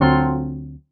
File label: stab honk F; stabs